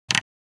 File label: Radio volume control knob handling